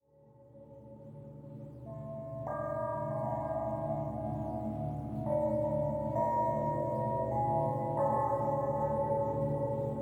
Bell Drone; Far Cry

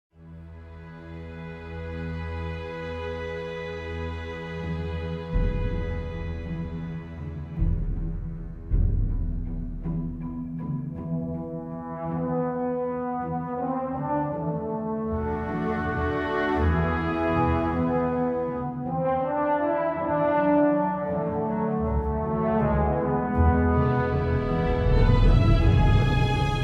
World Music

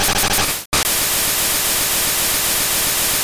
Leech Seed

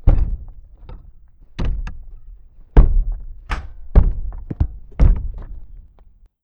banging; Lucius

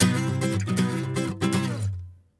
Guitar riff

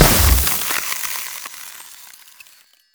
Explosion Ice; goddess of trampling

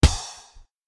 Box glove hit; bad piggies; android; mobile; windows

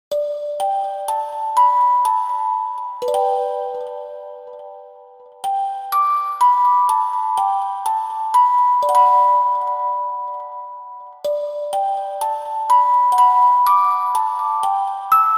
Music Box; Kemono Friends Cellien May Cry; macos; windows; Boku no Friend Music Box

